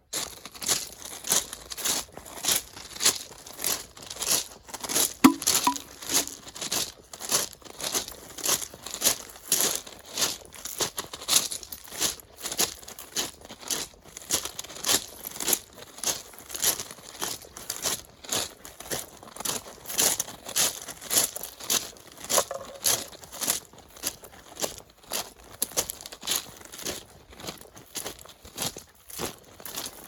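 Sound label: shagi shebenj, footsteps gravel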